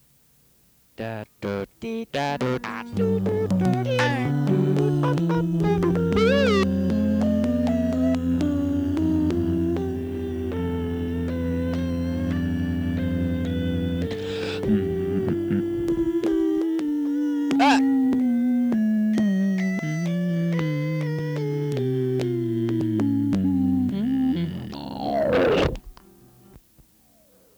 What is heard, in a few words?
Orchestra